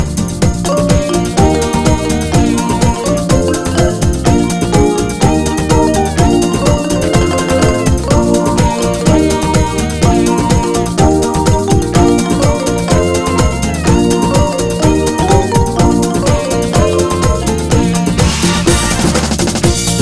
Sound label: Smooth but Hot